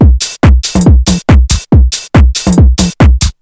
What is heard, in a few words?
cow, Techno Drums; TechnoMusicMaker